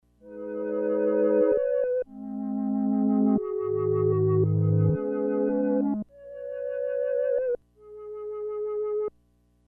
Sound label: Strawberry Flutes; Kris Rhen Presets